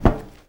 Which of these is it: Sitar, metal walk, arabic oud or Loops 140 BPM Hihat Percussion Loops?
metal walk